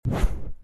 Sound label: slide